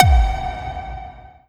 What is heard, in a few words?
New High Score